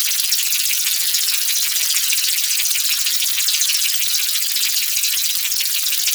Beam Fire Loop; Warframe; Veilbreaker; Venkra

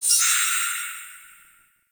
Mine Warning Pulse

